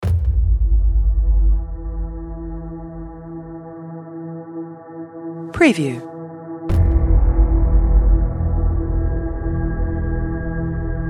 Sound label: Dark Cinematic Horror Scary Thriller Evil Trailer Music